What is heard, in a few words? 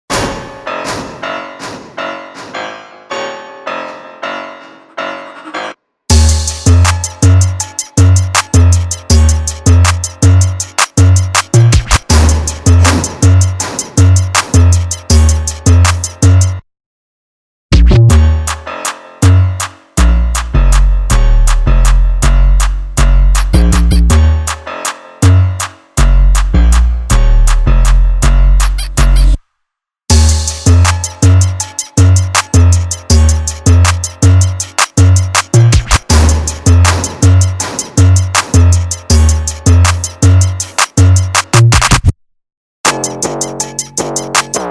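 instrumental, 80 bpm, E flat minor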